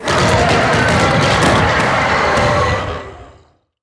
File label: death; murglun